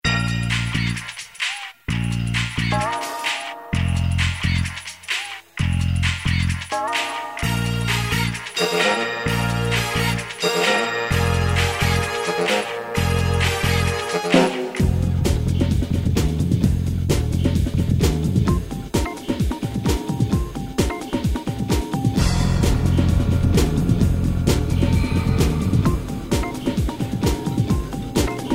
JUNGLE GRUNGE

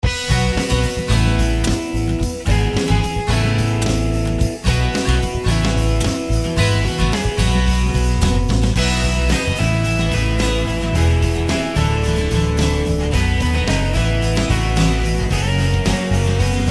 AMBIANCE POP MELODY

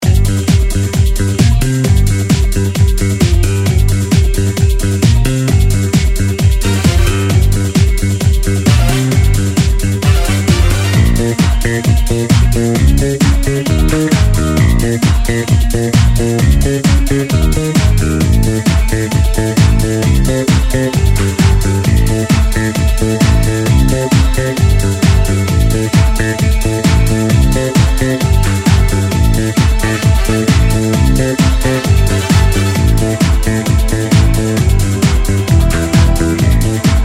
DISCO

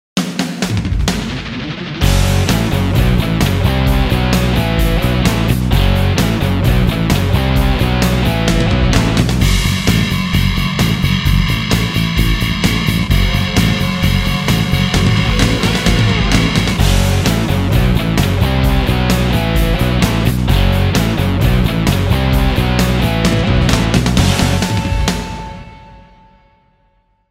TRANSITION POKER

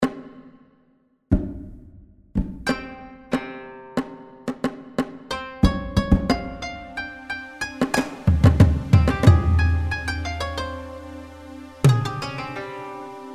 karate music

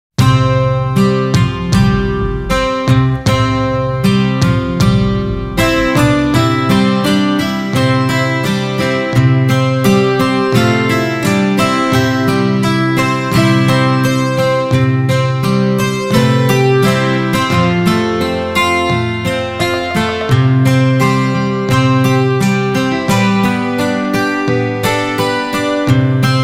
PACIFIC GUITARS

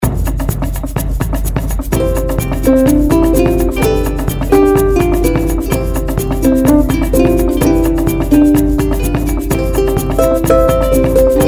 UKU UKU LAND

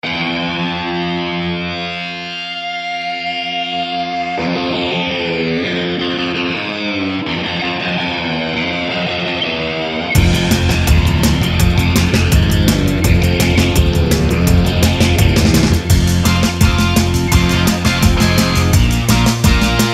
GUITAR

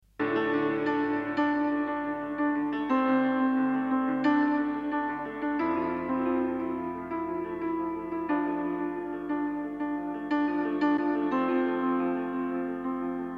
PIANO

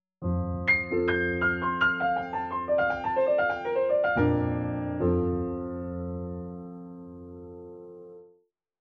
VIRGULE PIANO

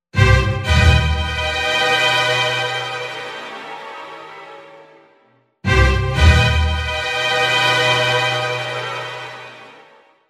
AMB FEAR

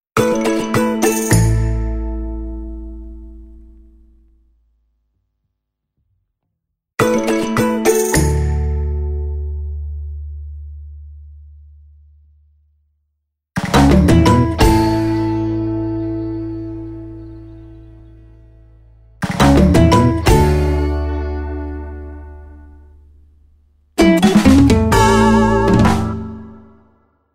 JINGLE UKULELE

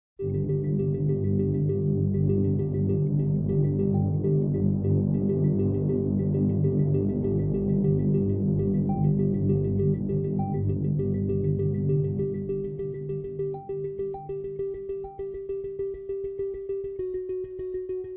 BASS SEQ

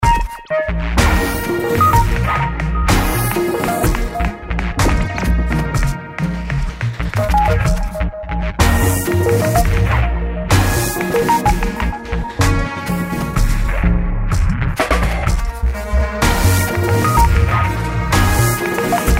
SLOW MOTION

